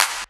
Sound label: Clap; Equipped Music; Loungin House